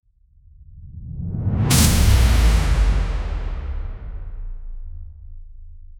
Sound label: countdown impact each